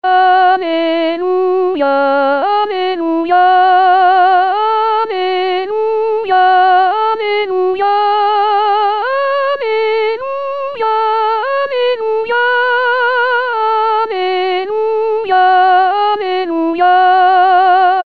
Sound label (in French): Alleluia Festif, Chorale; 2016 Paques